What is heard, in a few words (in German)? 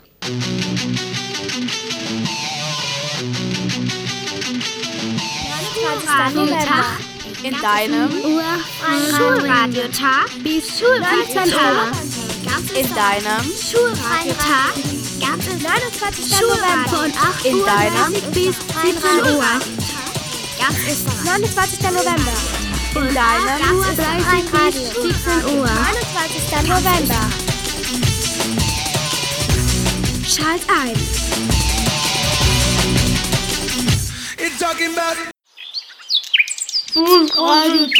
jingle; schrat 2024; Tabu